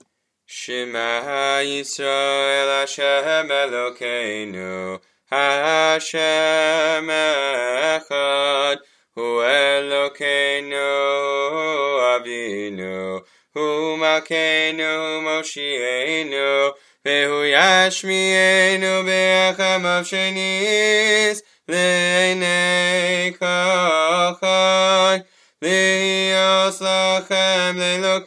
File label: Shema Yisrael yimloch Upbeat Melody; Musaf